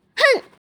女生哼生气; 惨叫发怒

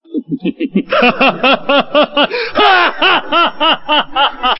断气笑; 笑声集合

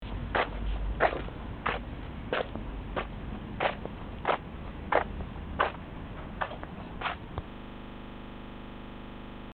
走路声; 走路跑步

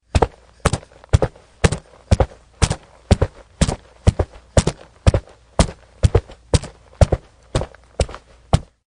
快速走路; 走路跑步

